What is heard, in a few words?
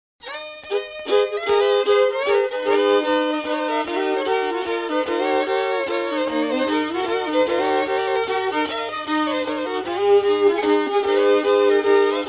Old Style Cajun Music, Gumbo Waltz